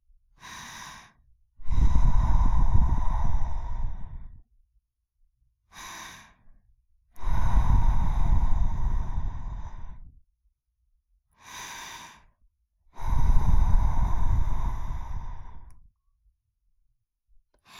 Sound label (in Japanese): 耳ふー 右